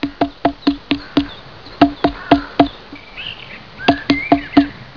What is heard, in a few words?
YAFFLE